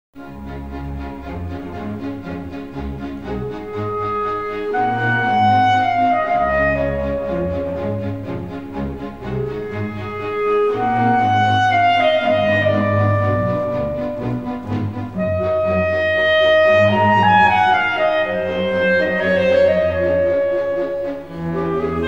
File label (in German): Klarinetten Konzert